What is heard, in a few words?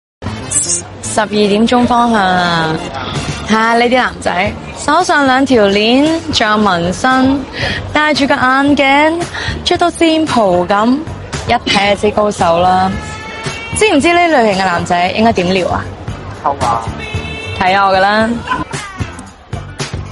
laugh; breadradio